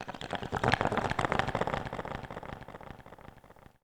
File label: ethno 125; eJay